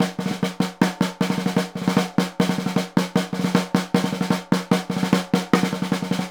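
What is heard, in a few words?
snare, Synclavier Percussion; Synclavier Percussion 2; World & Orchestral; ILIO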